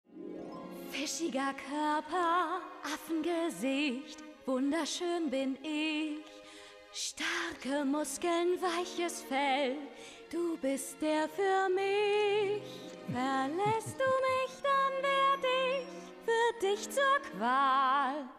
Gesang; Der Gestiefelte Kater

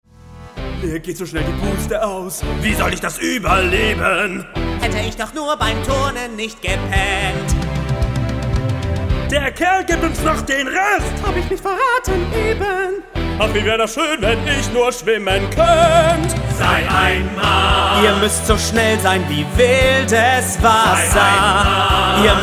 GESANG; CHARGEN; Shang

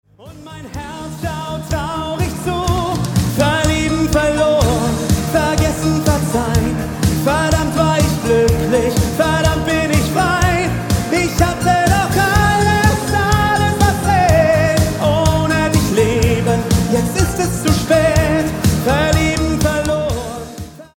GESANG SCHLAGER

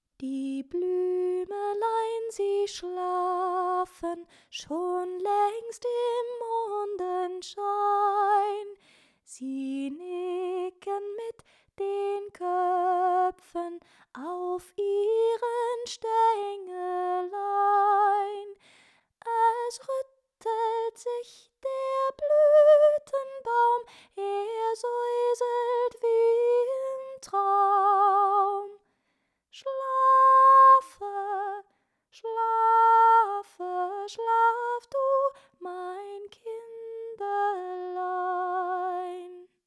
Gesang

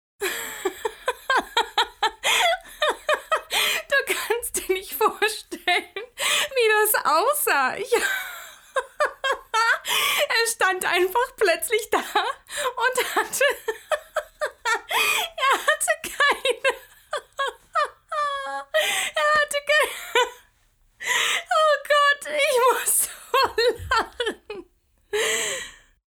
Lachen mit Text

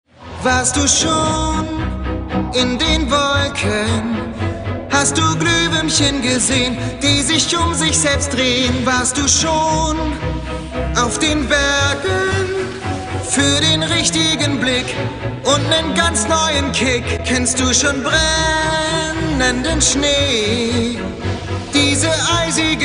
Gesang; Harvey Beaks

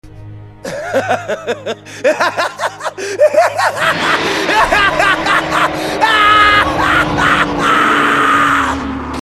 Verrückt Lachen

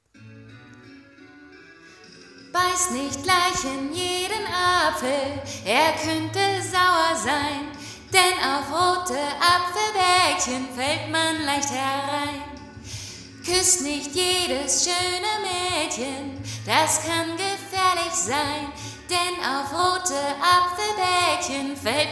Lied mit Echo

